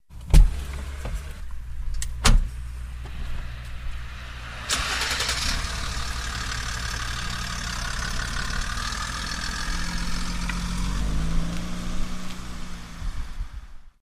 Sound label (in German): Mercedes E320 Abfahrt